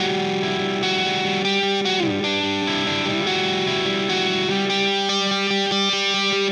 Silos Lead Guitar Riff, Indie Rock; Sonic Foundry (Sony Creative Software); Indie Rock Anthems; Session 11 - Silos

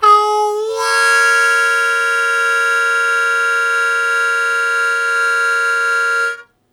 Blues Chord Attack F#1, Harmonica; Zero G

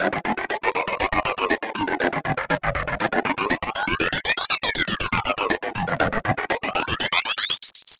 Synth Distorted; Electrocution

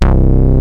MOOG BASS, ROLAND D - 70; Rubber Chicken Software Sample Bank

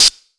126 DRM, Disco House; USB Soundscan vol.20; Fresh Disco House I; AKAI; 126 BEAT

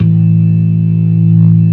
ORGAN, NO PERC

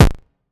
In-Tec, Kick Drums; Zero-G; Soundclash

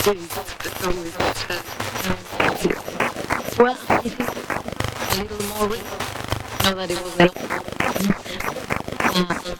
garble, Vocal Loops; Transmission-X

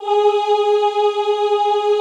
OOH G#3, Classical Choir; Best Service; Extended Classical Choir

